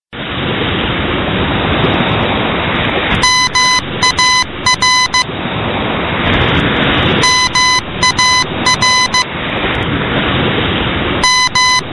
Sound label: Morse TOYS; MAR Shows 2011